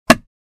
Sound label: Small sized thin wood panel hit